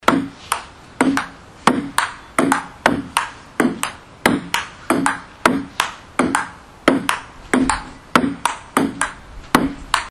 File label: pingring